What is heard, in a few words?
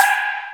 FX CYMBAL; E-MU Formula 4000 Series Vol. 2